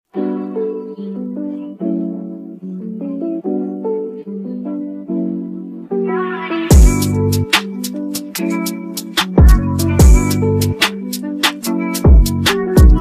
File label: instrumental